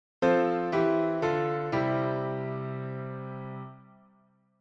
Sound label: conclusion, gospel piano; Gospel Licks